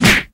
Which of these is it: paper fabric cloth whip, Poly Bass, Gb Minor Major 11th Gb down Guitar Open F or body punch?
body punch